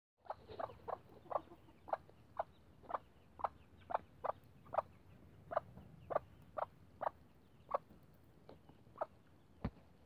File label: mommy calling babies over